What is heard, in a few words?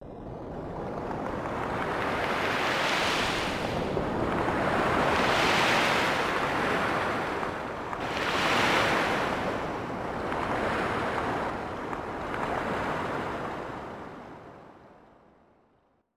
SeaShore; EverQuest